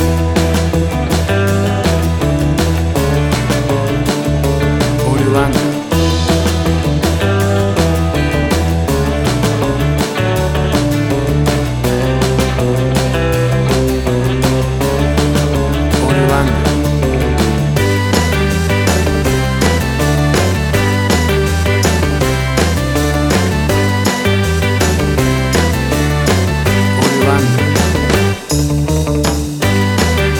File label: 60s Night Spot